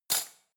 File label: cutlery spoon drawer throw